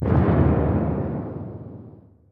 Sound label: Quest Failed; Monster Hunter 3 Ultimate; Monster Hunter 3G; 2011 gamerip